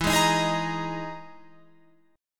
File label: E-Major Sharp 11th E, Guitar Open F; EM#11 strummed